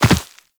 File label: Land Soft Dirt, Footsteps; Characters; Operator